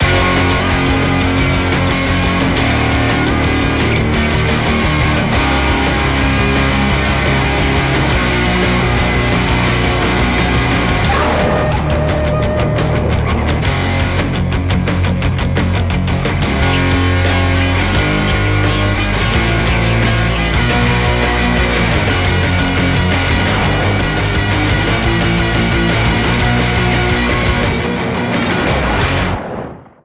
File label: Instrumental; Power Rangers Ninja Storm